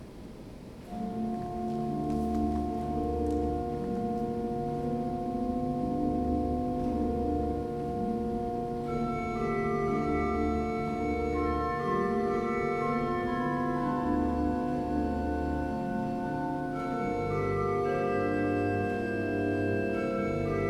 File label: choral orné, orgue; sur notre pere au royaume des cieux; anthologie musique protestante